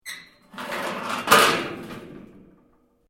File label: METAL PLATES SCRAPE CRASH